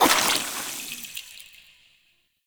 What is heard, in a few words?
Poison Shroom Spore Cast